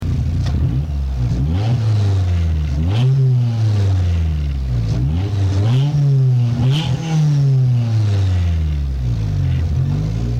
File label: rev at hood opening